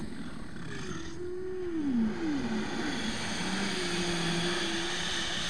roar; Iris' roar; Gamera

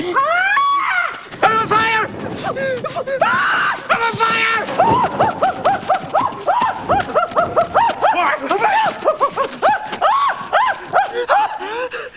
yelp; Quark on fire

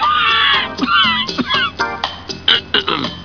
COUGH; [George coughs when cakemix gets in his face]; George And Martha

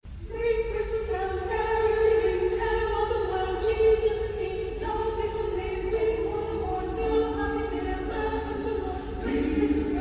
Choir; ceciliansingers

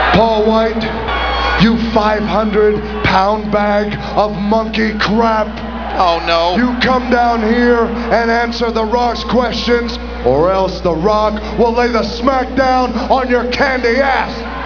rock

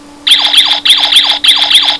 laser; megatron

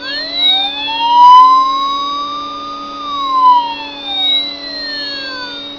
siren; Rescue Roy

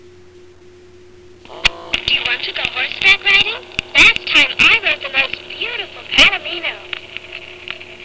Horseback Riding

